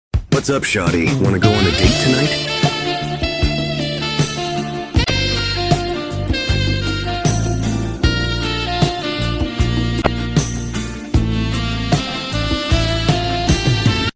romantic music remix; gojo

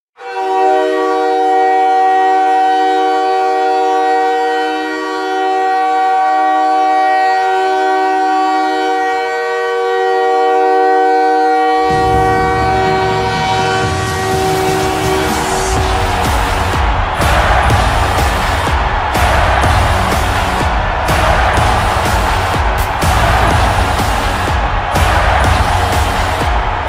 goal horn; habs

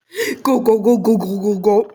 gulp gulp gulp gulp gulp gulpr

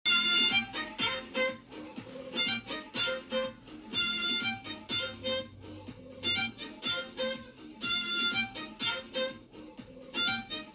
violin fireball

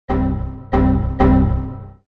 windows xp error

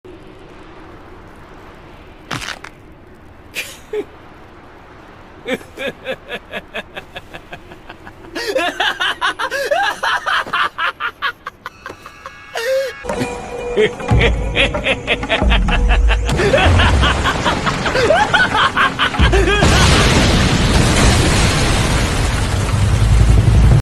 laughing; sukuna

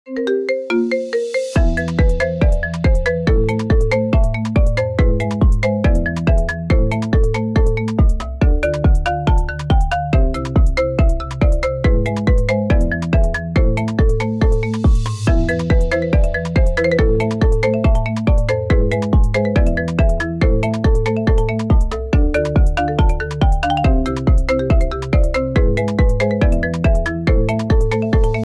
iphone ringtone song

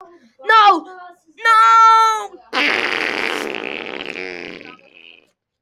nooooooo ahhhh

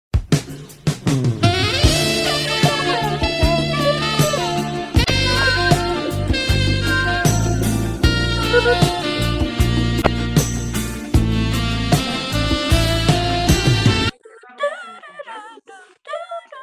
romantic music

